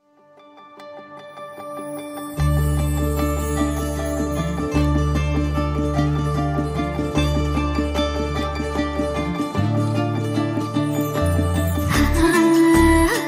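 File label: music background music ringtone; ramarao on duty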